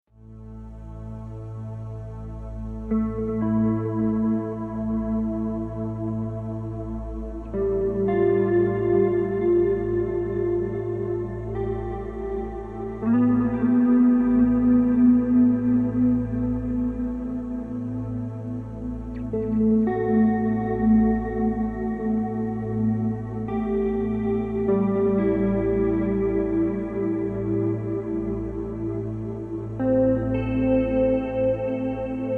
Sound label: Relaxing Music To Bring Down Your Stress; Spa